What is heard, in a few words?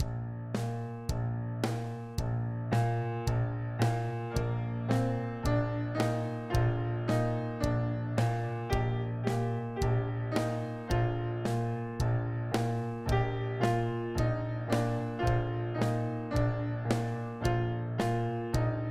Backing Track; a song about tempo